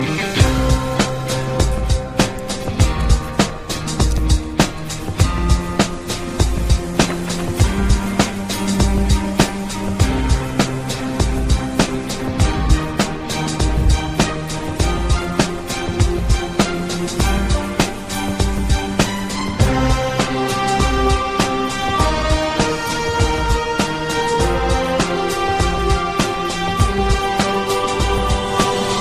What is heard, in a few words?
shoes ad; woodland; blues